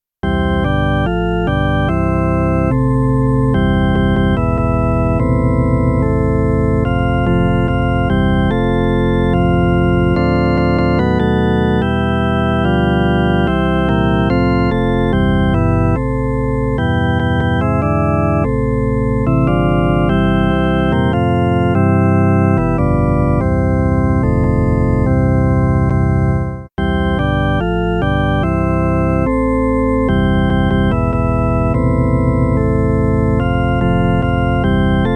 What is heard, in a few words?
This Little Light of Mine organ & Flute